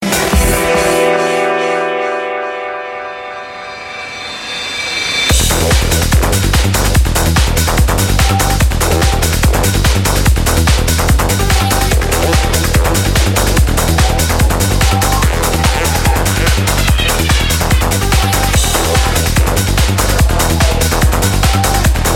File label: Psychodelic Ringtone